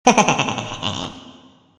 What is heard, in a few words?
hehe